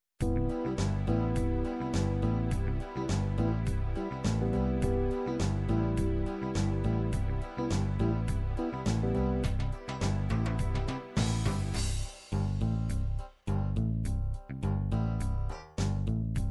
disco party, midi

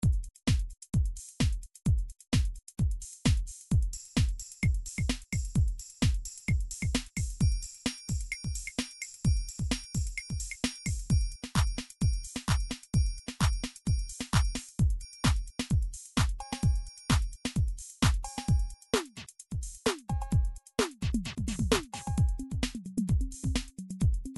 midi drum patterns drums house

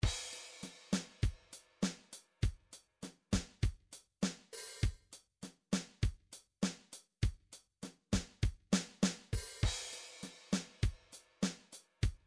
drums, midi drums

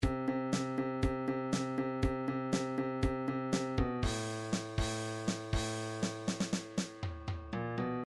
drums break, midi

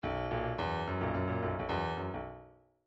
bdf midi file grooves funk l funk l

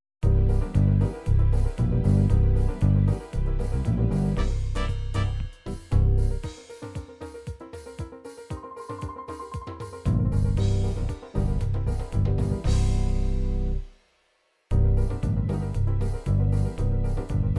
latin tropical, midi

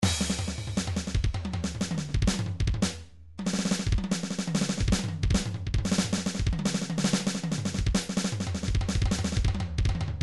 midi drums solo

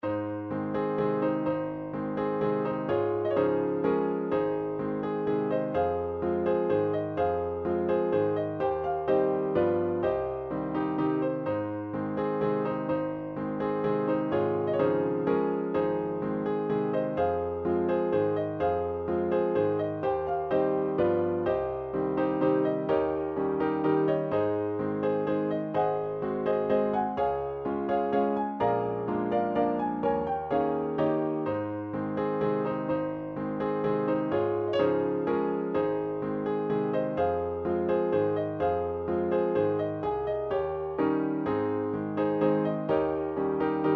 waltz, midi

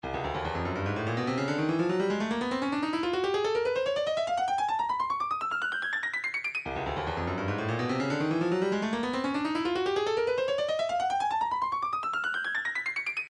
doumbek, midi; 135 Wide Shut

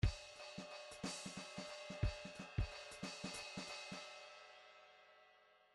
grooves 3 4

